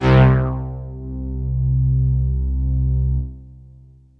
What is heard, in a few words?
Techno leads, hits and riffs